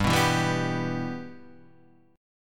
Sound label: E Minor G, Guitar